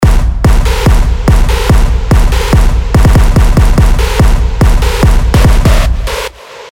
Screech Blunt Force, big room techno drops; Revealed Serum 2 Big Room Techno Drops Vol. 1

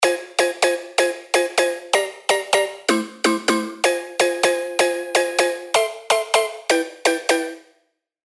SY Minor Bell; revealed serum 2 bass tech house vol 1

